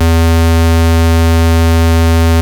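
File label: square, yamaha rm1x synth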